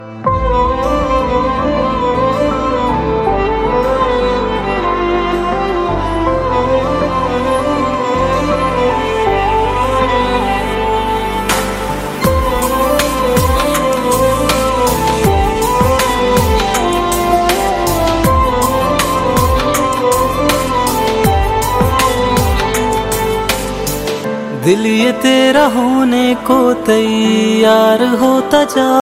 ringtone bollywood ringtones

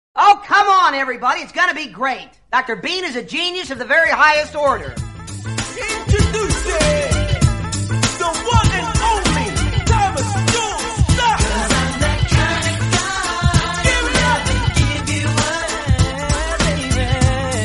come on funny musical tone ringtone

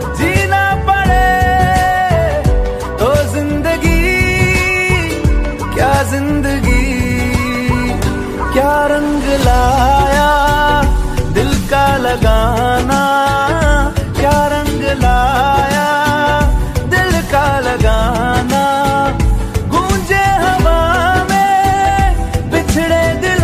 love romantic ringtone, bollywood ringtones

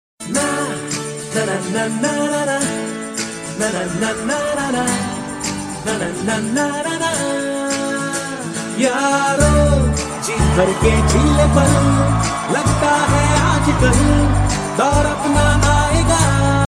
sms tone ringtone

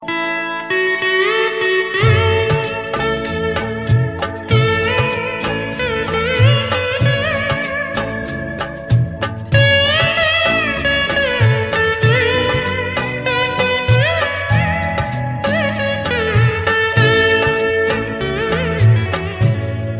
romantic love instrumental ringtone; bollywood

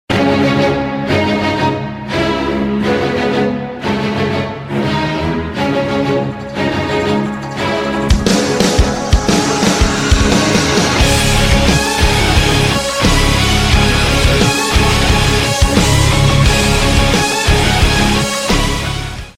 rebirthing metal ringtone

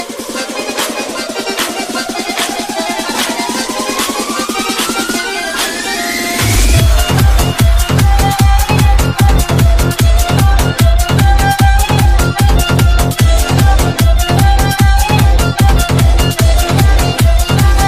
malayalam ringtone

